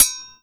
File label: Perc Kenkeni Bell Hit; circ Afrique V4